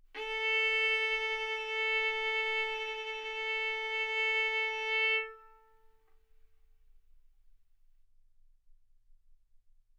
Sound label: Violin arco A4 B4; mf; sul A; stereo